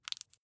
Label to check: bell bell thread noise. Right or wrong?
Wrong